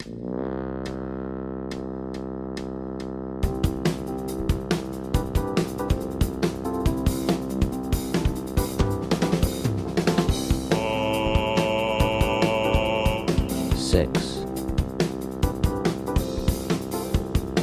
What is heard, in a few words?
Slash Chords No Bass; slash chord ear training